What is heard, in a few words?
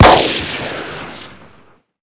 snub rev shoot crit; tf2classic